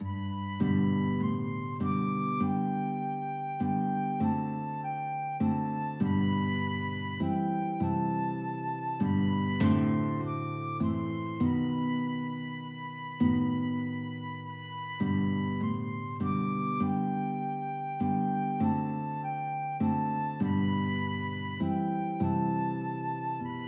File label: guitar flute, duet